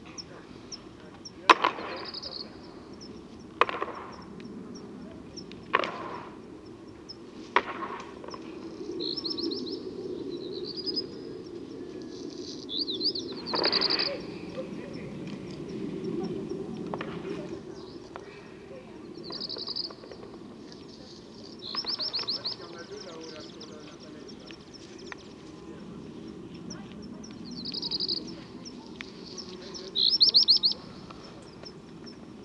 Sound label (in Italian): Stambecco scontro tra corna; xenocanto; der steinbock